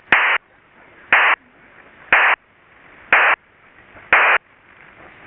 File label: Rohde & Schwarz 2400 Bd PSK burst waveform, short; A single station trying to re-establish connection with another node